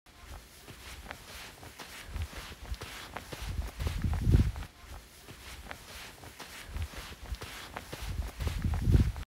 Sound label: Walking slowly on sand